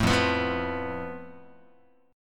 F Major Flat 5th Guitar, chord; FMb5 strummed; JGuitar

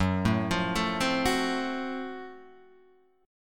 F sharp Minor Major 7th Flat 5th, guitar; F#mM7b5 Chord {2 0 3 2 1 2} arpeggiated

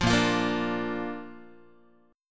A sharp Major, Guitar; strummed; JGuitar

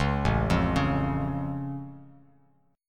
A Augmented A down Bass Standard

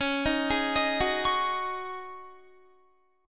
Db Suspended 2nd Suspended 4th Db Guitar; arpeggiated

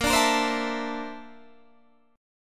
Bb Major Sharp 11th Bb down Guitar DADGAD, chord

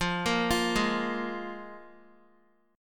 F Minor Double Flat 5th, Guitar; Fmbb5 arpeggiated; JGuitar

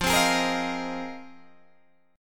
F Minor 11th, Guitar Open D

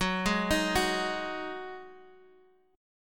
Eb Minor Double Flat 5th Gb

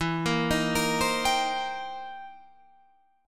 C Minor 7th E; Cm7/E arpeggiated

